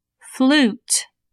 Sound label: flute